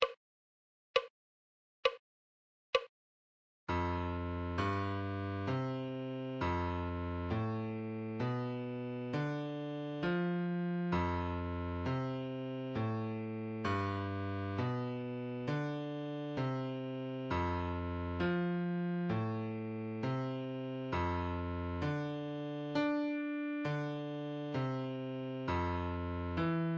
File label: musical reading in bass clef Adagio